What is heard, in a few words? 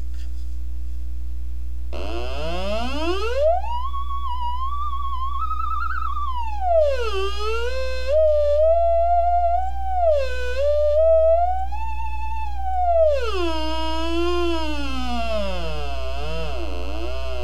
theremin